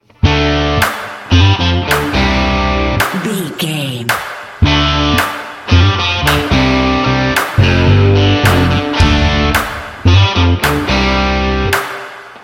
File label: highway rock